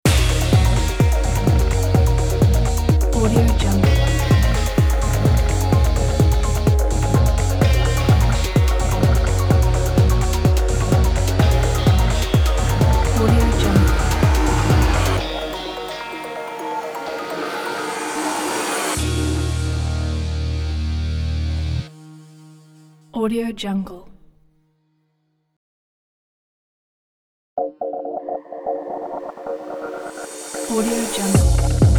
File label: automotive intro ident